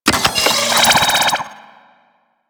Orbital Strike Warning; Enemies; Warframe; Kela De Thaym

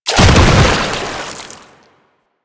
Bodyfall Water; Enemies; Warframe; Proto Infested

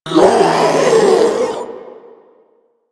Strike Scream; Enemies; Abilities; Warframe; Proto Infested Ancient

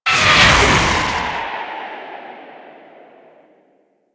Dive; Warframe; Tengu

